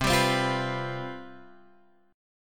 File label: C Major 7th Suspended 4th, down